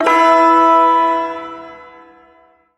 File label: Shawzin Chromatic Chord; Warframe; Duviri Ersatz Shawzin; Chords